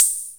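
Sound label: open hi-hat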